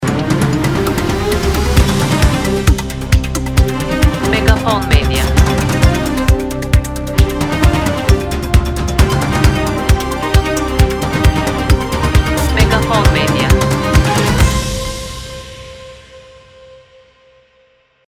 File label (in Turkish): TV PROGRAM JENERIK; BENGUTURK